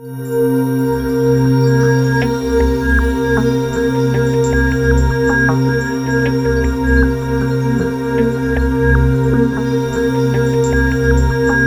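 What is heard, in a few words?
sequence pad, ethereal atmospheres; Soundscan - Ethereal Atmospheres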